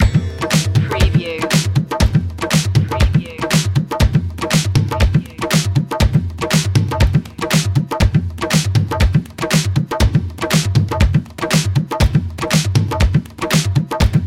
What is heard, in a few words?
techno india loop